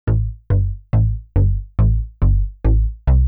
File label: TECHNO BASS 140 A